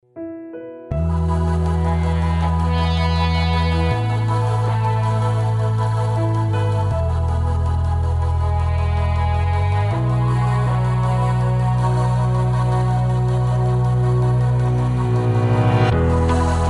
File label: Traditional Track